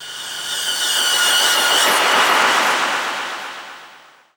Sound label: Scream; Blu Mar Ten; Jungle Jungle - 1989 to 1999 Samplepack